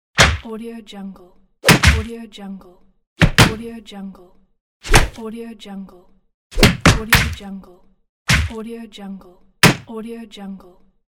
punches

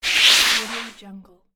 sock slide swish; electronplanet

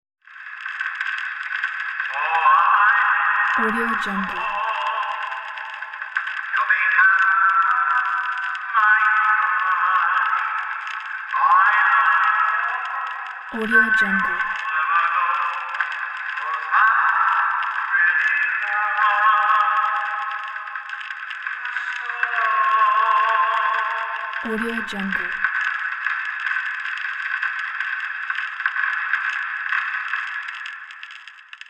spooky old crackly 78 record playing in ballroom

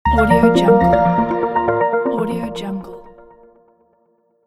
light soft short opening logo

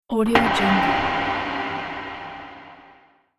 horror atmospheric metallic noise

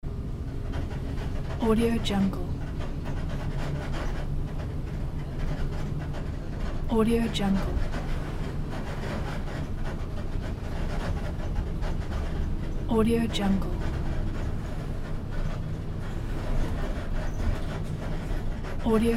old bus interior noise

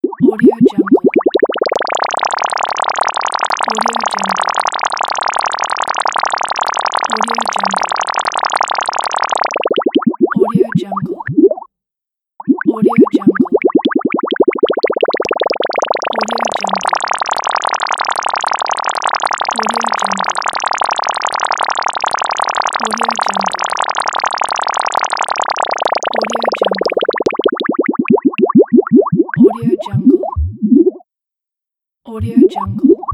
cartoon submarine

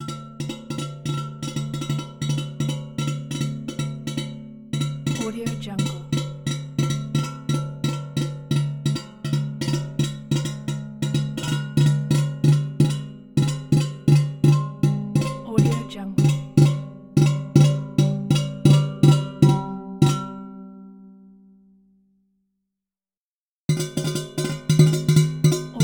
periodic metal judder

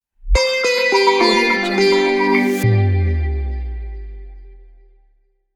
corporate sitar logo

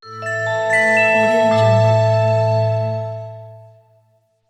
logo boot sound

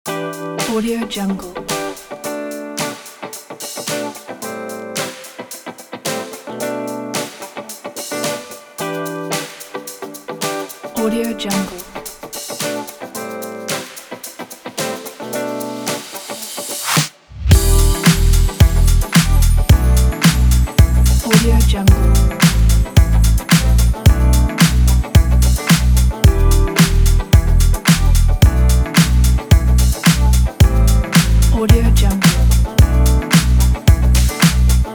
nu disco summer background; jinias

